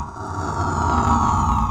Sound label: Airy alien